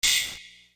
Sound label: Shaker; Sonic Foundry (Sony Creative Software); Infinite Soul VOL-1